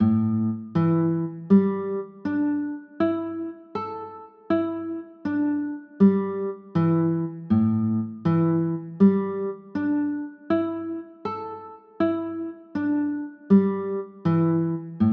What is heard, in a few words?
a sus4, chords